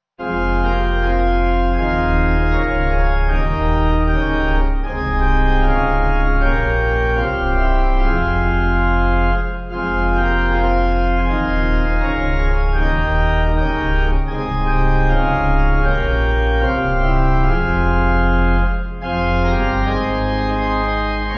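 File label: Pipe Organ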